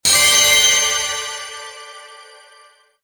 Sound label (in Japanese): mahou